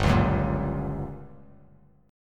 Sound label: G Minor Major 7th Flat 5th G, Bass Standard; strummed; JGuitar